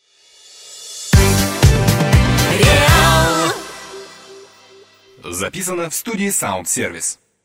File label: Jingle